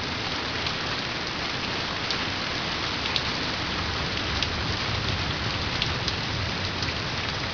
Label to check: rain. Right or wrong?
Right